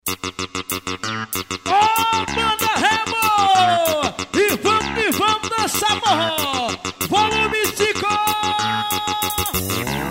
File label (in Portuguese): forró